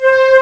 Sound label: Sweep, Amiga Soundtracker; ST-XX Amiga Soundtracker Sample Packs